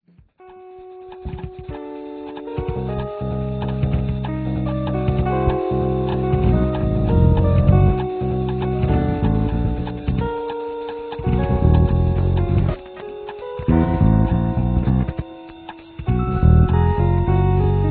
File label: lofi